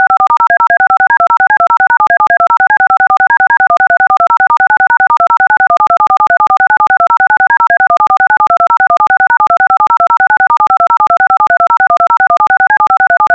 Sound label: dtmf